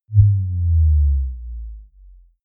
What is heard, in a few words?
bass drop simple soft; whooshes rises; hits impacts